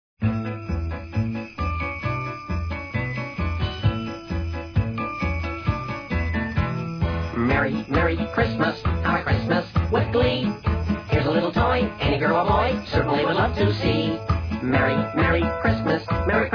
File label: Merry Merry Christmas